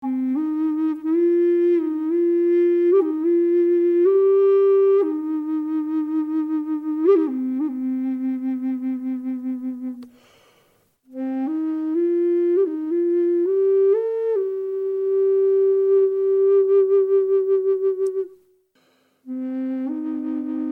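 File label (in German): C ohne Effekte, drone flute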